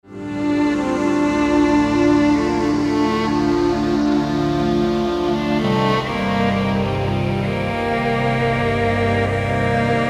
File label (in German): Jupiter50 violine